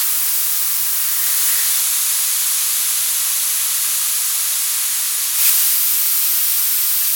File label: rocket warmup hiss; bms objects